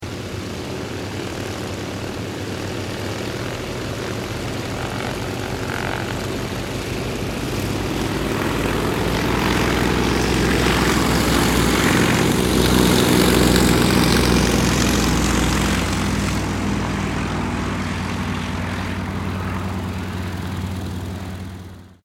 aircraft yakovlev yak-52 squadron takeoff; 1977

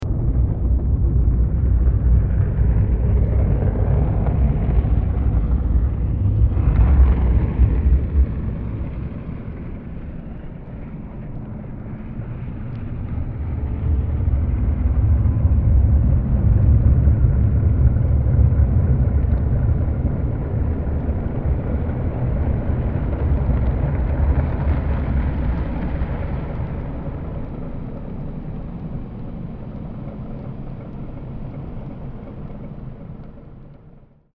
ferry

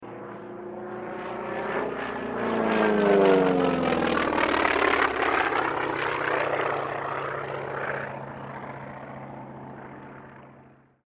aircraft t-28d trojan pass; 1963

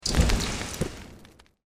created magic spell fireball impact stereo

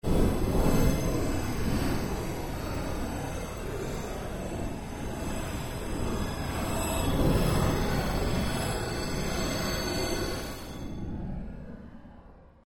created ambience fluctuation stereo

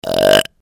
female burp big

